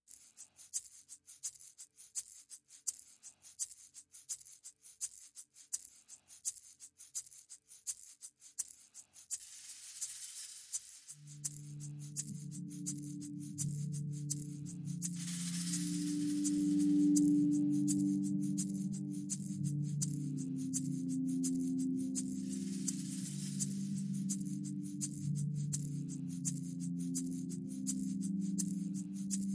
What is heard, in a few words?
pads and perc